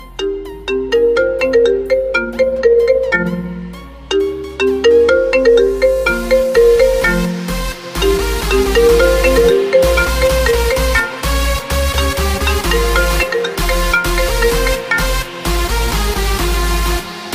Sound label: iPhone Summer Mix Ringtone